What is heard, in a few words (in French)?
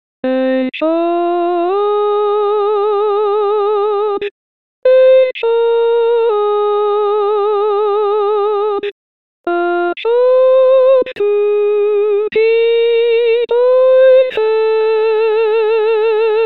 Hymne var 4 tutti